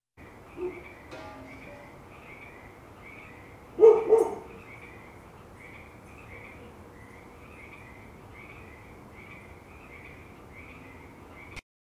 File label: Suburban Neighborhood